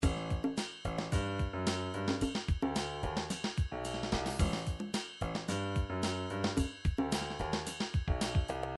triphop, midi; Newtronic Triphop Construction Kit

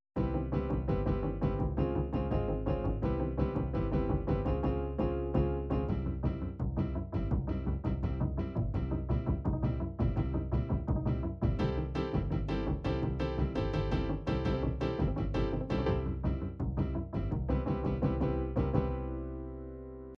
bossa, midi

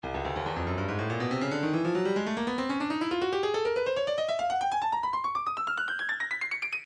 Percussion Bongos, midi; Stylus

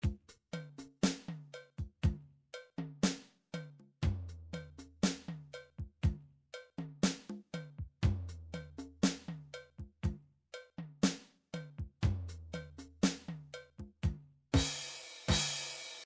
drums

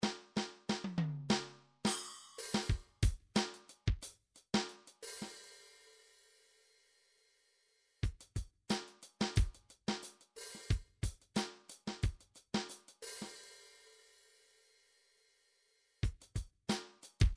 drums, midi

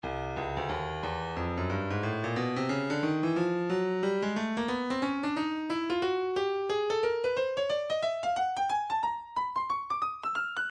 breakbeat 090 bpm; Stylus; Nu Skool

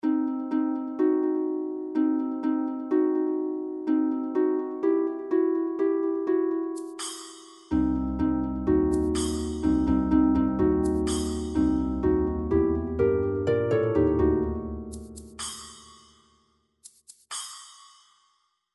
eerie harp remix; gameboy; sequenced; legend of zelda; level 2 wing dungeon

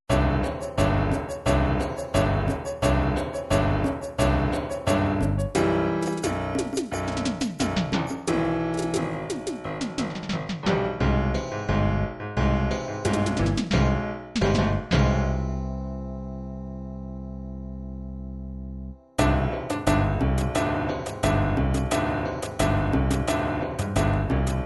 techno tex mex, midi

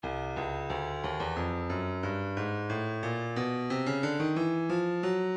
breakbeat midi files 090 091 bpm; hipping c; Stylus Breakbeat Midi Files

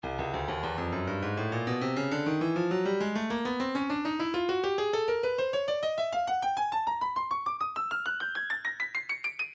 breakbeat midi, 101 bpm; freemont c; Stylus Breakbeat Midi Files

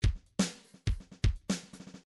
midi grooves funk

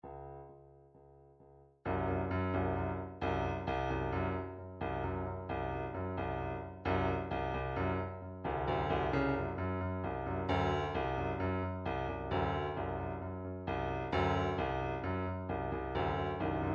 drumtrax alternative 132; Drumtrax Library 2

